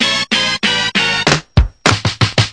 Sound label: hiphop horn break, drum loops and breaks, 095 bpm; 091 to 100 bpm